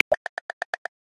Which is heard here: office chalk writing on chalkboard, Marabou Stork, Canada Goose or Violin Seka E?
Marabou Stork